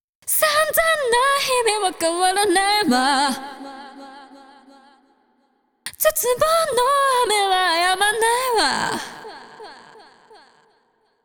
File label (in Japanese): わぁ ボーカル